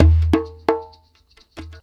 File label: HOT PERC, Roots Disco; USB Soundscan vol.32; AKAI